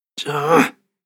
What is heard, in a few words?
Human Fx Grunt; Andrew Fleming